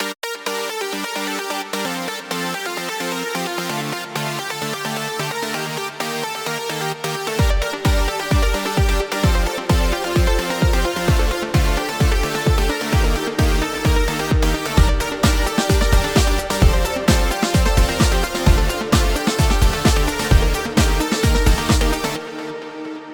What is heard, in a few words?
trailer